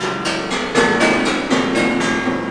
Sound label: Piano perc; SpecOps2MPlayerDemo; Music